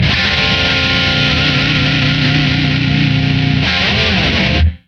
Guitar Mega Power; Back to the Future The Game; Android iOS PS3 Wii Windows Xbox 360 gamerip 2010

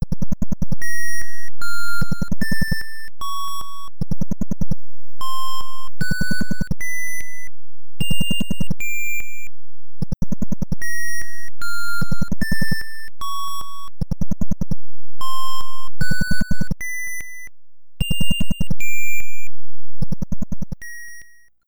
Item Room, Stereo

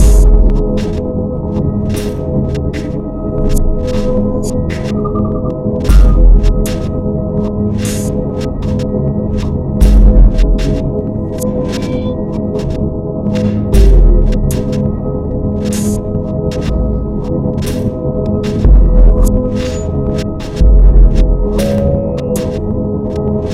Lazy Beat; puss soundtrack

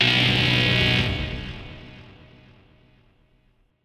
Jingle Wrong; Final Answer

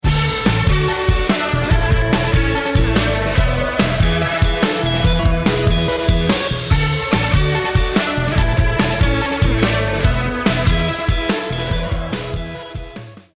round mvp anthem

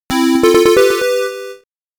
Bonus Game Start; Fatal Fury; x68000

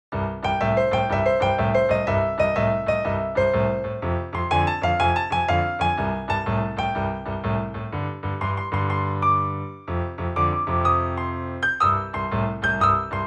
Snow Chalet Piano; MySims Agents

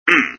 SFX sound (baron swing in)

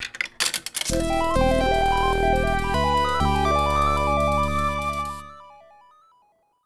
Arcade Start

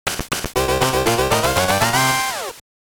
Rescue Game - Success!; Teenage Mutant Ninja Turtles 2 Back from the Sewers; Gameboy

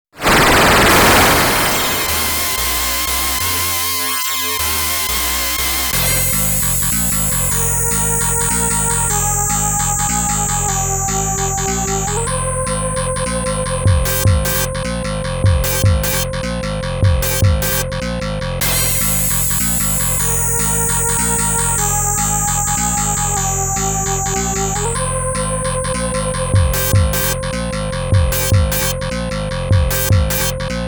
Boss BGM; Taito Digital Sound Archives; Arcade Vol.5

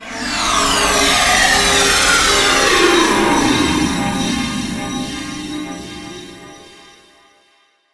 Space Warp Go; Wario Land: Shake It!

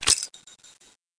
Grenade Pin; Learn to Fly 3

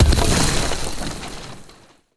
Explosion Debris; boomerang fu

